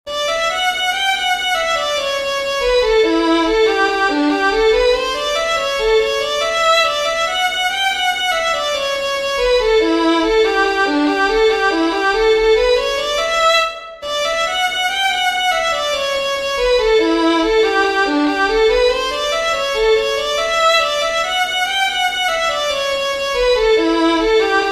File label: REEL, fiddlers; THE ACCIDENTAL REEL